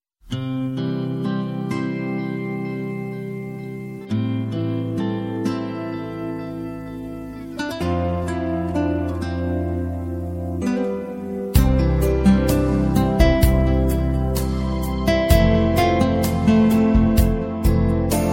guitar impression ringtone

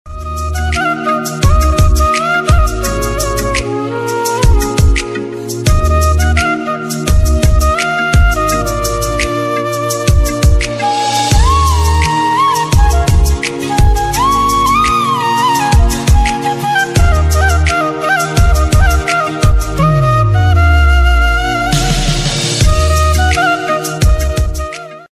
sweet romantic ringtone, bollywood ringtones

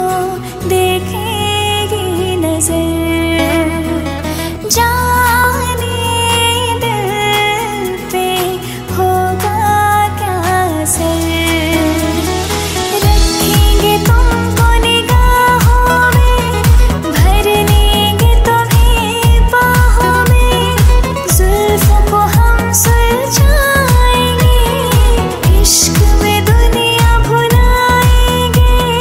hindi music ringtone, bollywood; bollywood ringtones

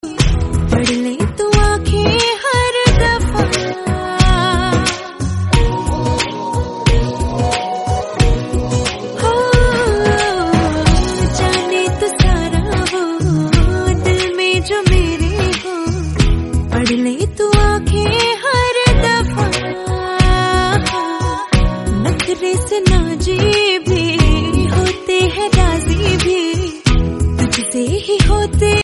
bollywood song tone ringtone

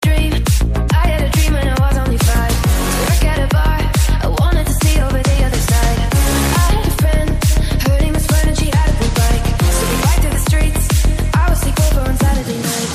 english ringtone

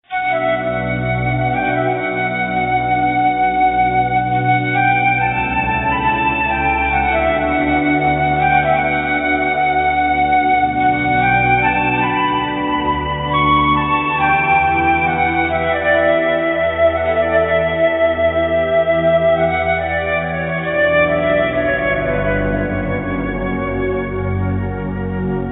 classic flute ringtone

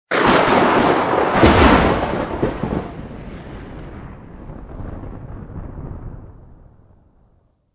lightning thunder sound ringtone

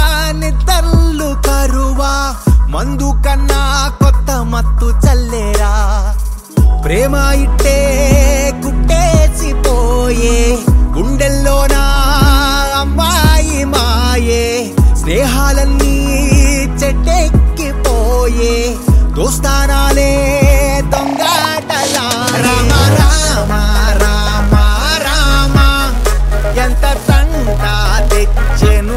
telugu song ringtone

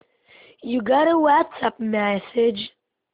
whatsapp message ringtone, sms

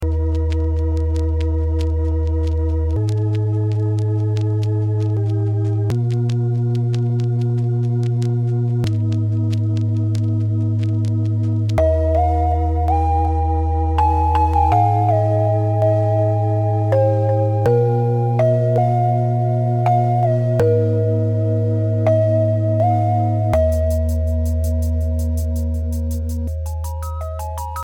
keygen music; turok 2008

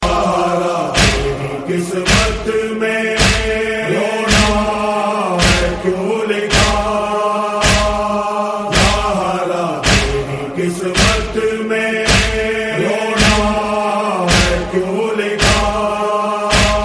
noha tone

